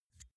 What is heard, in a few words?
pull out xlr extension; objekte; elektronik